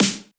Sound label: soft hit clap